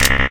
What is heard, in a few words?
ammo none; cdogs